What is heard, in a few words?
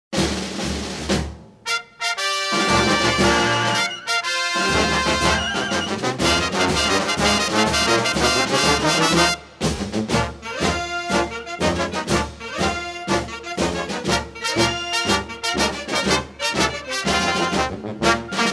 March